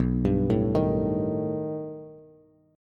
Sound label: B Augmented 7th B down Bass; arpeggiated; JGuitar